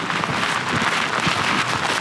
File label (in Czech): auto sterk; Mafie 1